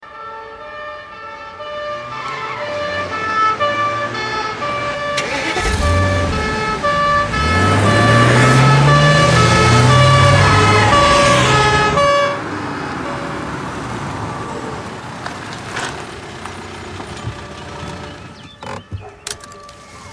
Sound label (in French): police